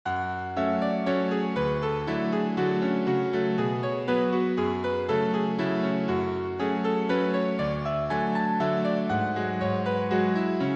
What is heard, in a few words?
waltz; waltzes